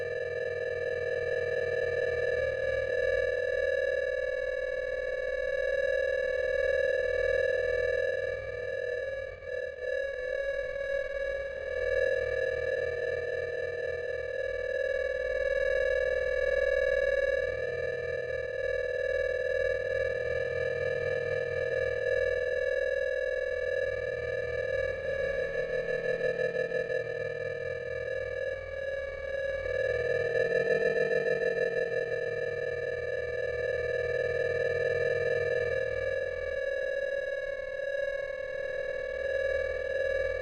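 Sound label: drone hover distant loop; Titanfall 2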